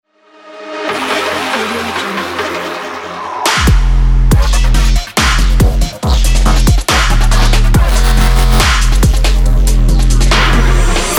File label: lightning logo; sumpulsesounds